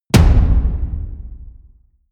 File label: drum hit simple medium